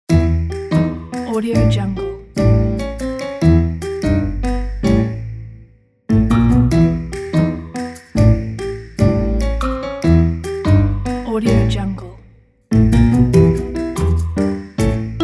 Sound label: funny